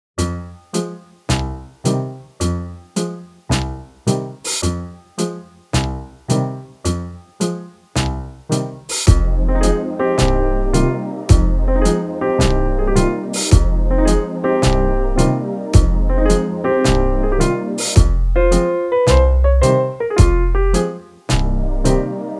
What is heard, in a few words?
108 Fm